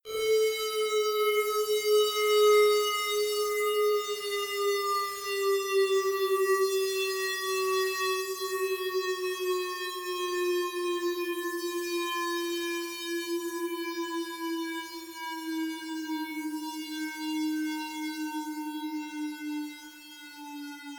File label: strange noise